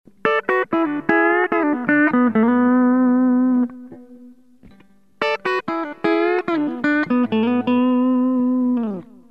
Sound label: Coil Tap